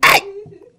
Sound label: ay; Voice; ooh ahh